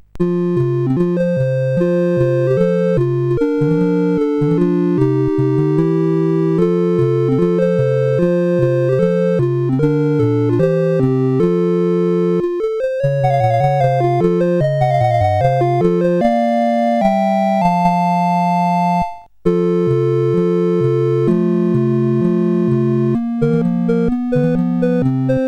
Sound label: sid CSG8580R5; Pitfall II-Lost Caverns